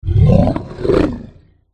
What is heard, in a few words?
white bear attack voice bottom